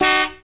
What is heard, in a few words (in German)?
hupe neu